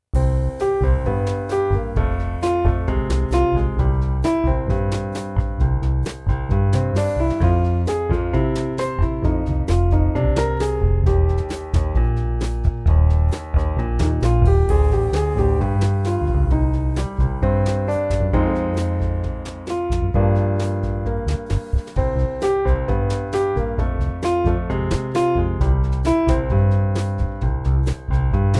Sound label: bossa, piano